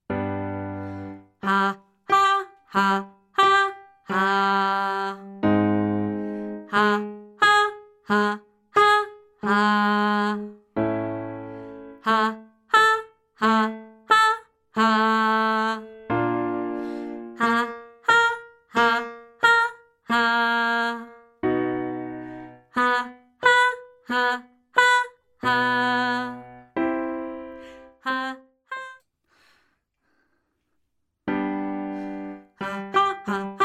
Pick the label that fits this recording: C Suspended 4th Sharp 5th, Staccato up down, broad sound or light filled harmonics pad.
Staccato up down